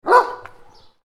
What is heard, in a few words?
single dog woof sound